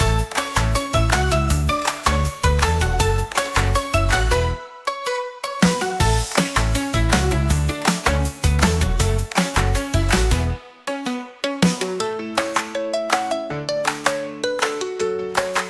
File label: Stomp Jazzy